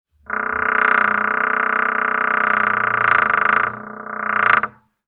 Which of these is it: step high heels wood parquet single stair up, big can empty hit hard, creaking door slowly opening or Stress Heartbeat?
creaking door slowly opening